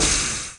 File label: jump; needleman